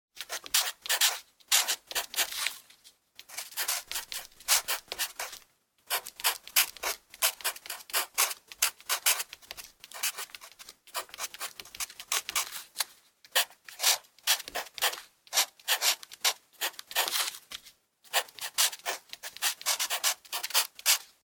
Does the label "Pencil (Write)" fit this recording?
Yes